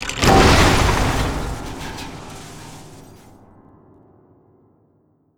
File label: trap impact piano